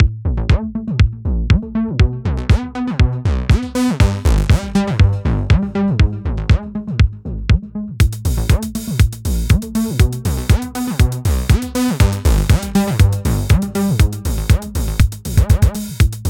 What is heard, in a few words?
pure 707